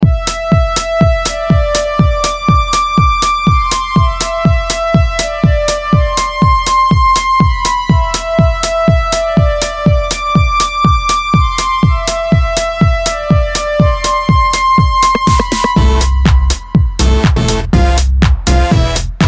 chicago house

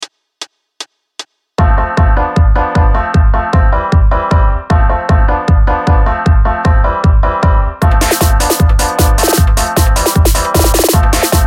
happy hardcore

